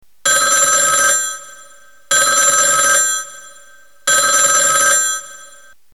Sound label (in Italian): Telefono